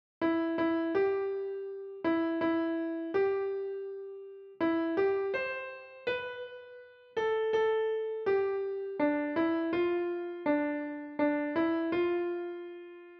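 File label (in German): Klavier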